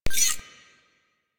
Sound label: Camera Aim Connect; Warframe; Veilbreaker; Quests; Kahl